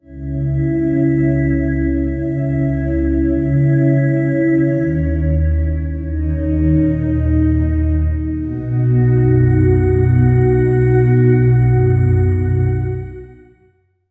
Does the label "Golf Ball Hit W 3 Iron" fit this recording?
No